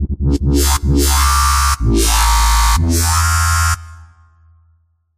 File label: growler